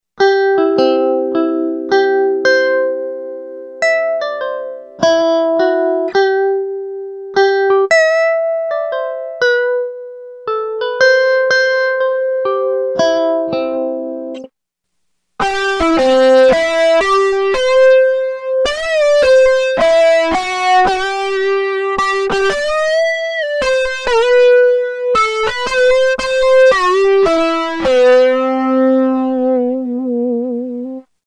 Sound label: DIST.+SYMPHONIC, Yamaha REX50